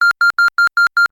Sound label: multiple Beep; Zomboid 41.78.16; Project Zomboid